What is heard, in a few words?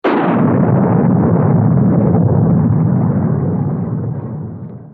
Atomic Explosion; FoS; Fat Man